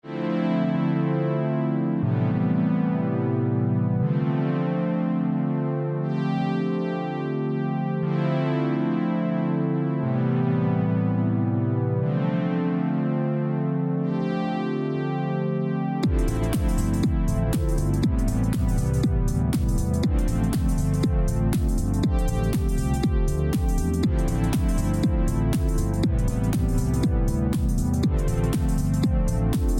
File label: music dance